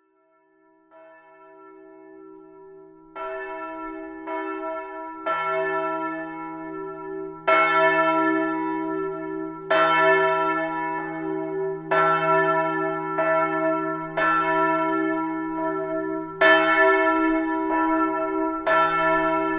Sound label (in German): glocke, Dobbertin